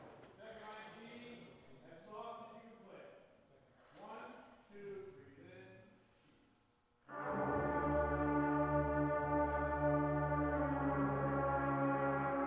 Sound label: Warm Down, brass; north star brass; woburn ma